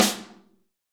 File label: SN AMBIENT; Best Service; Real Mega Drums VOL-1